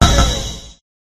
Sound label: space dock closing